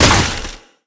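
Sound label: magic explosion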